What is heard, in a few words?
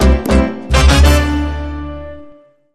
Hit